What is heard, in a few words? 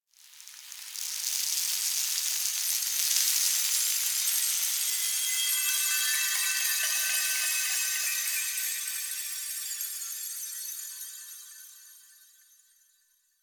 Throne Room Sand Pour Chimes; Ballas; Warframe; The New War; Narmer Outpost